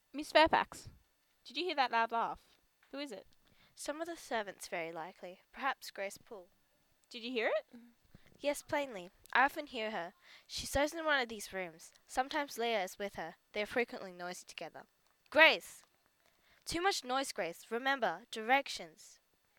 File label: Laughter as talking; Mrs Fairfax and Jane; thornfield room hall